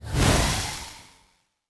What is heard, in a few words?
Fire Spell, Magic Sounds; Fantasy Sounds Bundle